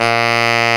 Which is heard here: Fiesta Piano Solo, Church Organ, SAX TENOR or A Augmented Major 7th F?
SAX TENOR